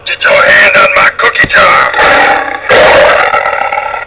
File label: tiger cookie jar; The Original 'Tiger Cookie Jar.'; "Get yo' hand out my cookie jar."